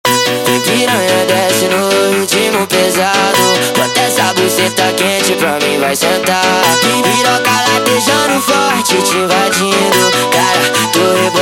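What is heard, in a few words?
sped Up, phonk music; funk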